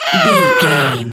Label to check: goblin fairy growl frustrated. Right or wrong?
Right